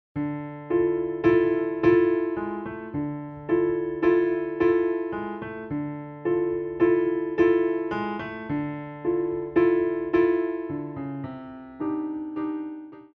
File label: Clockwork Clown, mixed-strings group; Strings 2020